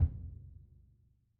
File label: Bass Drum hit; Membranophones; Struck Membranophones; Bass Drum 1